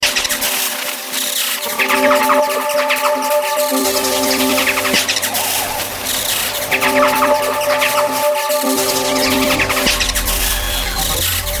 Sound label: Korg Synth Sounds